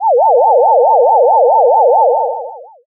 ufo

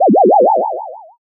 teleport

